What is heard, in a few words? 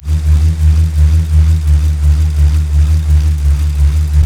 bull, bullroarer, primitive instruments